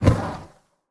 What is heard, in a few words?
Burning Hands Whoosh; Evolve; Meteor Goliath